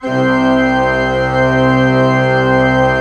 ORG, CHURCH ORGAN; Roland - LA Composer vol. 1

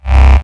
Basses Root C

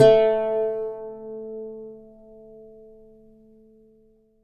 plucked Lute A3; Polyend; Simulacrum Sounds; 1 Shots

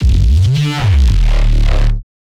Phase Plant, bass

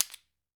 AFRICAN, African Perc; E-MU Formula 4000 Series Vol. 4; Earth Tones

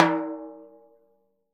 TIMBHI RIM, Synclavier Percussion; ILIO; Synclavier Percussion 2; World & Orchestral

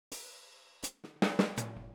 Boogaloo Hi Hat, 82 BPM

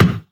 Percussions

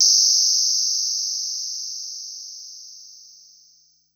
Noise High, Korg Wavestation-SR, Drum Kit

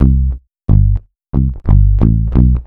COUNTRY

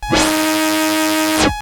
Monotron Sci-Fi

